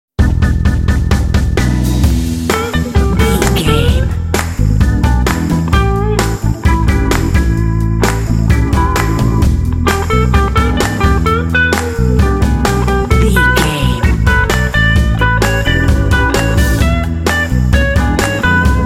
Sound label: blues rock